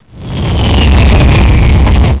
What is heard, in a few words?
flyby